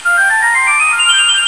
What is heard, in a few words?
Flute